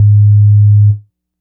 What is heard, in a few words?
Dub Bass one shot, Basses; Electronic Point Blank